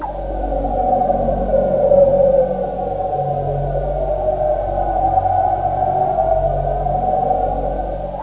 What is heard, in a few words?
alien creeper, ambience